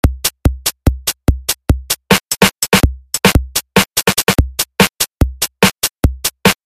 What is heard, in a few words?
beat